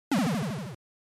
NES General Pitch

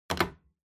Cell Phone Hardware Put Down Table

